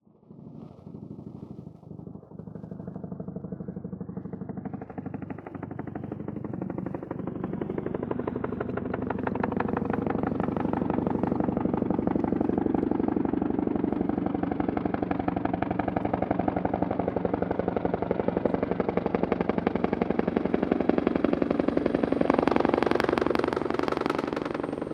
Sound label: EXT Helicopter Approach Land Idle Bell Ranger; Vehicles; AMBISONICS